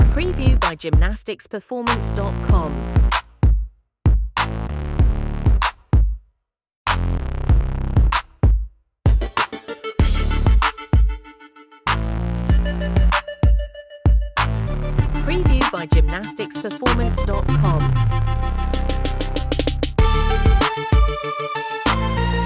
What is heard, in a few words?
hip hop